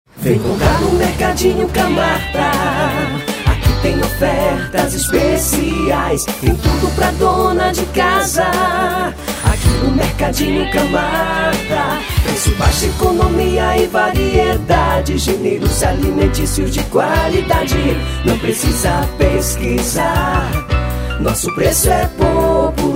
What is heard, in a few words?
jingle; mercadinho camarta